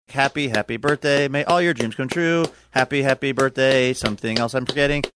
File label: clapping singing happy happy birthday may all your dreams come true